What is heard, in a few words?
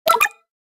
Digital click